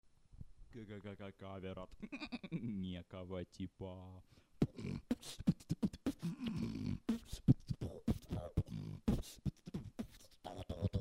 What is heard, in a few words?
rock and roll, beatbox